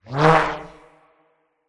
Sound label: Sfx Tennis